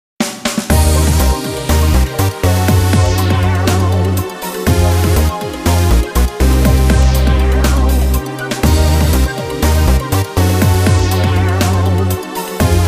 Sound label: Music Success event